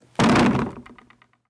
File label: Things falling on floor